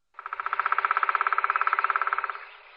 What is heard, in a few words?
Woodpecker